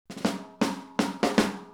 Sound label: 160 Country Shuffle Drums; Pop Rock